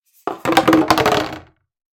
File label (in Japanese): ペットボトルが転がる音